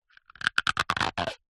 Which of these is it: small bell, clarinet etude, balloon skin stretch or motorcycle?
balloon skin stretch